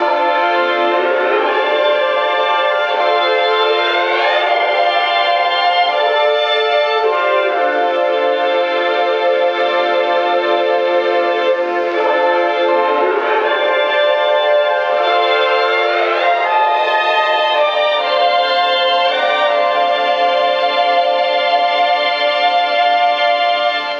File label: 80 melodic loop Bb; flame